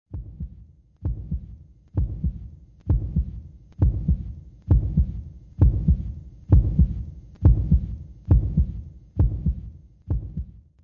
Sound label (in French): battement woody coeur